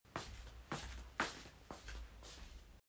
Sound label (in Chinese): 拖鞋走路